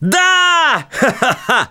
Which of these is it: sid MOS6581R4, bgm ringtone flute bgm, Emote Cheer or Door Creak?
Emote Cheer